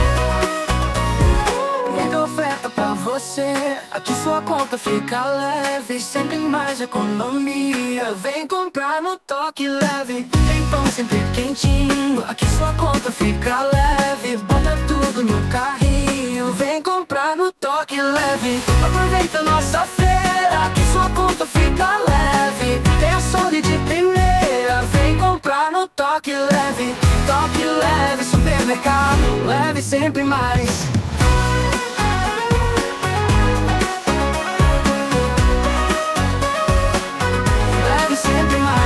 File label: jingle; Tok Leve